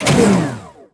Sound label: door small Metal; doors elevators; Star Wars Obi-Wan; XBOX